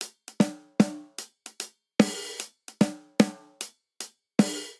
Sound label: Swing Waltz Snare & Hihat 3/4 150 bpm; MIDI Clips; Ableton; Factory Packs; Drum Booth